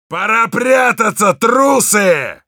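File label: Heavy yell, Russian; «Пора прятаться, трусы!»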